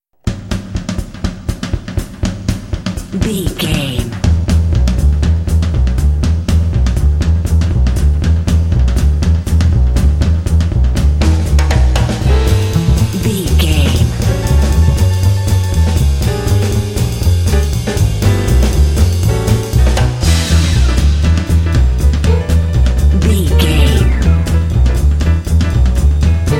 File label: swing time bed